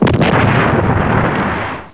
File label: explod; thexder